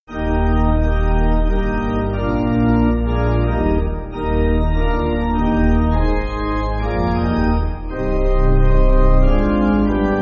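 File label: Pipe, Pipe Organ, Music Backing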